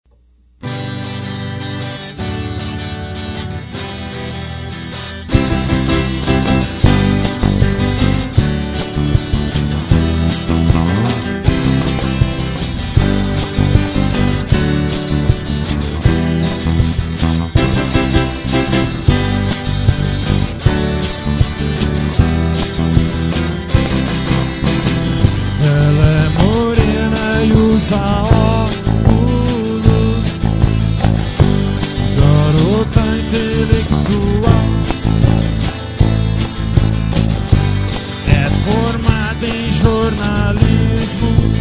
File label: rock roll